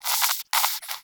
Rodent Vocal Snore; Warframe; Hunting Critters; Characters

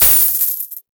Fist Claw Coin Impact; Weapons; Warframe; Tenno; Incarnon

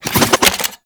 Fist Spin; Archwing; Melee; Grn Arch Regor Fists

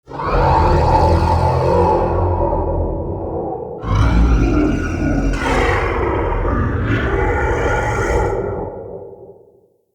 Root Cave Root Chatter; Ambience; Neathuns Root Cave

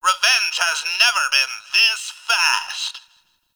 Atomicycle Game Bark; Ambience; Props; Warframe; Nineteen Ninety Nine

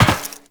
Land Metal Perforated, Footsteps; Warframe; Tenno

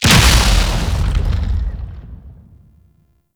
Tau Sentient Vessel Step; Warframe; Movement

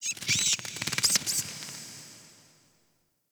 Interior Cave Bat; Warframe; Duviri; Ambience

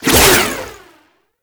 Finisher Parazon Throw; Warframe; Kuva Lich; Finishers

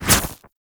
Ghoul Drill Flame Burst; Warframe; Grineer Ghouls; Ghoul Drill Sergeant

